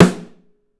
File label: Drums, STEEL; 3D Drums